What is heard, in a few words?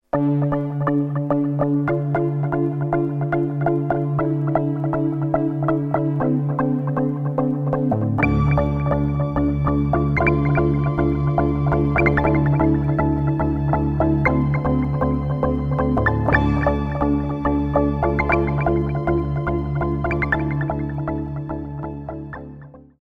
Korg Trident Basstrigger